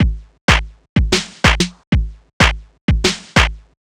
drum loop roger stripped 125, Classic Chicago House; Sample Magic; Drum Loops